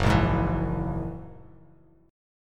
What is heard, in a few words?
F sharp 9th F sharp Bass Standard; F#9 strummed